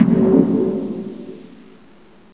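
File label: boss hit; Terra; TERACIDE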